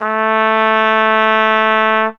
Trumpet mf A3; dsp2008 sound library

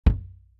kick; dsp2008 sound library

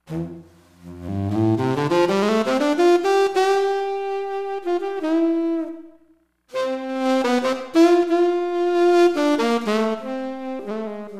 tenor sax

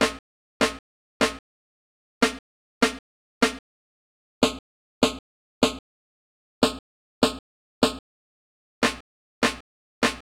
Snare vs Snare

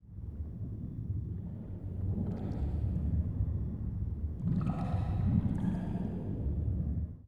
bubble

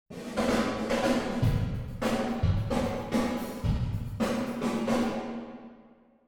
cont varying drum

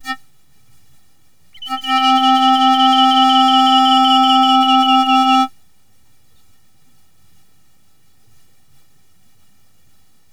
Nature

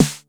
snare

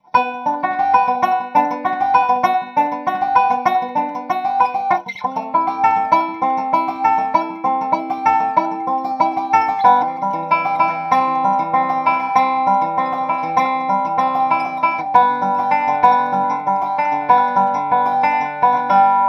banjo through dobro invfz

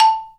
marimba

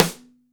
snare

